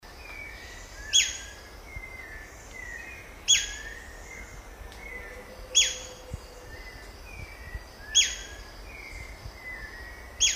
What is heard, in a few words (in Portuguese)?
canto do patinho de asa castanha